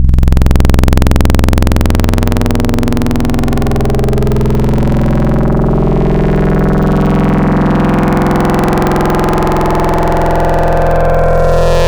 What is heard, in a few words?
1 + 3 Saw Sweep, Wavetables; Cymatics; Wavetables Collection Vol 3; Serum